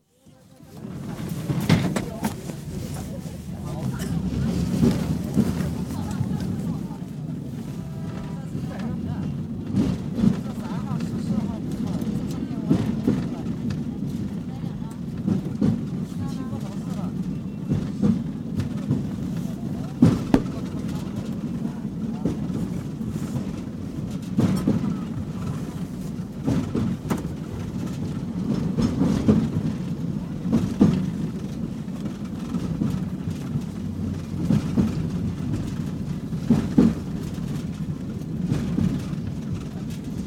Changchun: Tram, interior, tram ride with stops & indistinct voices; China; BBC